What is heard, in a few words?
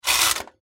Typewriter Bar Slide Antique; Historical; Typing; Offices; Technology; Blastwave